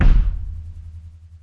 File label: Kick FX layer; Kick Snare Layers; Drum Bits; layering